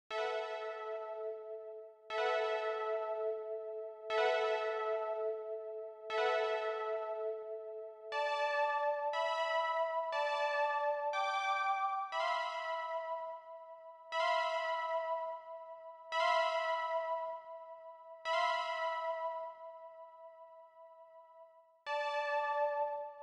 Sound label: Fanfare for video game